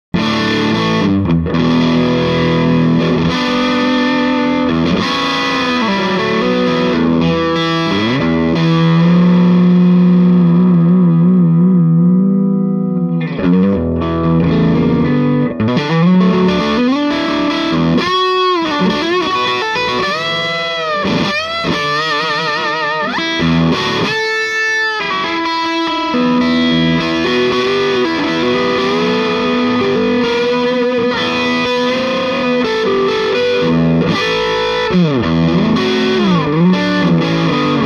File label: Swart 30 EL34 Tele Grind; super 30